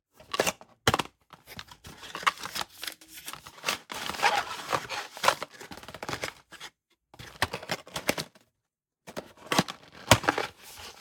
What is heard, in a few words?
KITCHEN cardboard box manipulating; Essential Kitchen